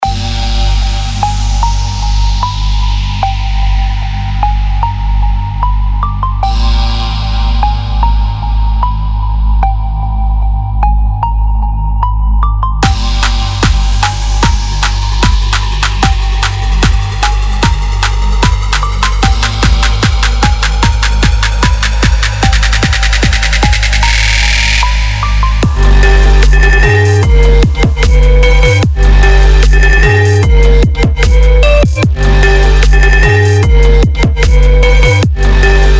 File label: Hybrid Trap Tools